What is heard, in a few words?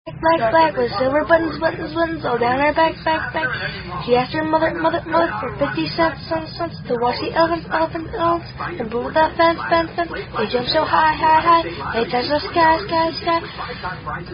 Miss Mary Mac Song, Without Hand Clapping